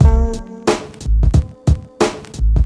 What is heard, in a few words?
hiphop drumloop dry